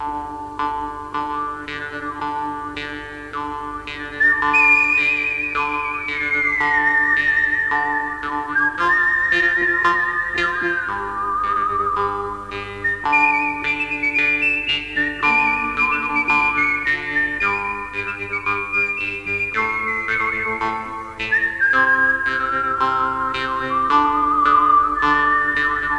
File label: armonica; deagle ~el~duelo v7b